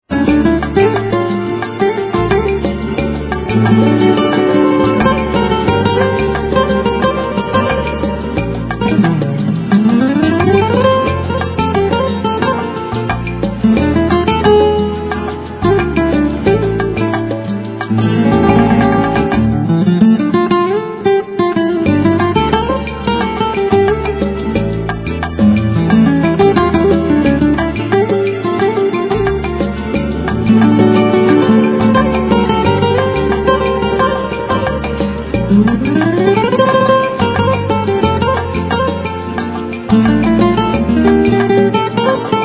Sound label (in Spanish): amor de guitarra